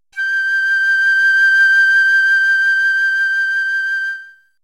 high G6 piccolo

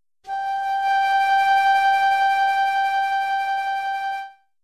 high C6 oboe

